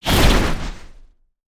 Spinjitsu Ice Shuriken Project

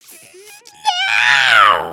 collapse

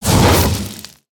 Attack Fireball; Dragon In A Box; destructibles

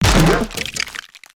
instrument smash; destructibles

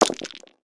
DICE; random

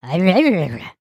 Stunned; creatures organic; Lego; Strombie

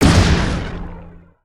Energy Ball Fire; weapons; aronwk collection; ndaudio

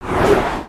Text Whip By; aronwk collection; ndaudio

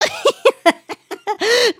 just kidding, emotes

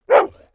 doberman bark

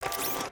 Fidget Arm; emotes; Overbuild

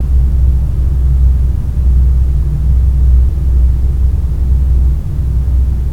Pod, ambience spaceship

